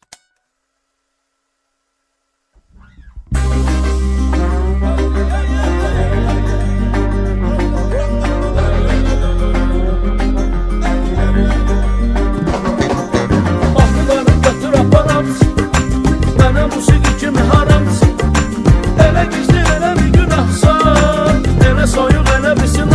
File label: azeri bass music remix